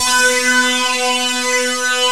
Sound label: ANALOG GIANT; OMI - Universe of Sounds